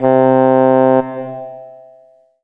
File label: Kawai K11 Oboe C3